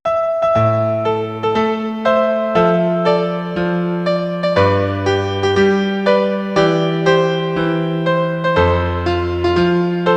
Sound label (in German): klavierfassung